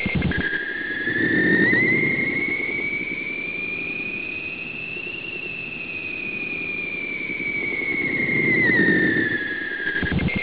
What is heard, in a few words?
alien noise